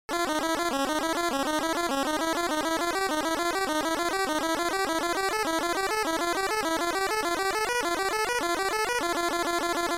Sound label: 8-bit Guitar Madness